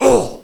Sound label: orc small hit; wesnoth